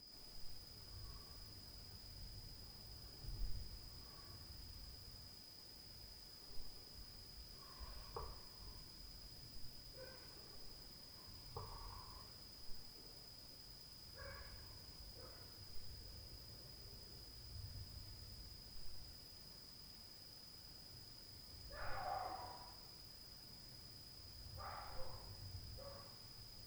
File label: VARIOUS DOG + TAC + DOOR + UPSTAIR LF BOOM, Night Noise